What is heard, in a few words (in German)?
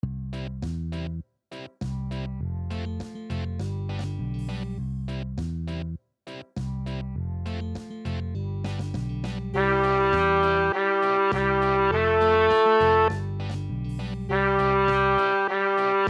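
reaggy für trompete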